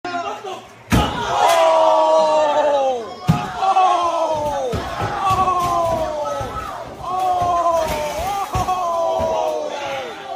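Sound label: guy throws rock at the tv and breaks tv; audio meme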